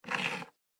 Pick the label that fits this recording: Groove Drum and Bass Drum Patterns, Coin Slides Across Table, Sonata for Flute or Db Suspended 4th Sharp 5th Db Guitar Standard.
Coin Slides Across Table